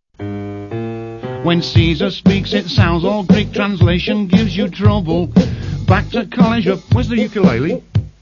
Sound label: where ukulele